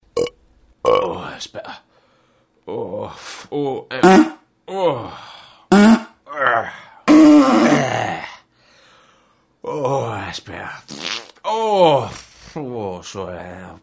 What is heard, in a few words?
Burp Vs Fart